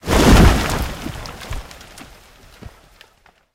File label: tree fall